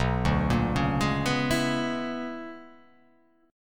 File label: B Minor Major 13th, chord; BmM13; arpeggiated; x,2,0,3,1,3,1,1; JGuitar